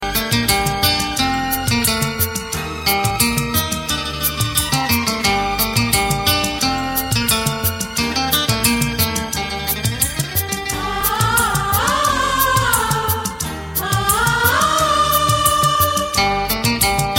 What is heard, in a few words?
Romantic Guitar